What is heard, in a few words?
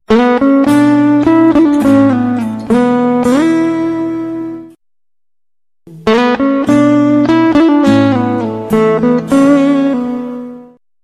guitar message